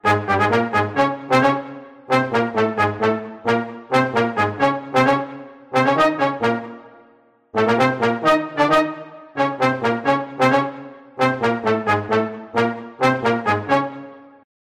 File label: Brass Ensemble Staccato; Aeternus Brass; Syntheway